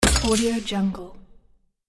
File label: metal fail click; jbobstudio